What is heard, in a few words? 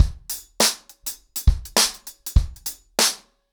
100BPM, ACOUSTIC DRUMS